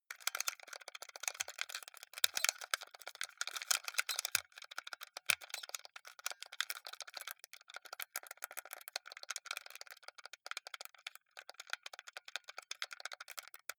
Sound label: console controller xbox play sequence hectical